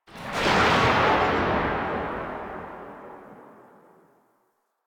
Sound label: Gunbrella Tail, Weapons; Warframe; Tenno; Koumei